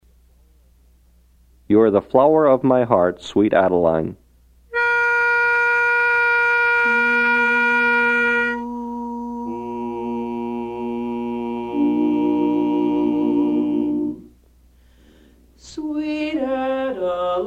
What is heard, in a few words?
Tenor, barbershop; Sweet Adeline